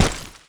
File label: grunt dirt run